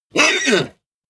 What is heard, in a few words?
horse vocal hit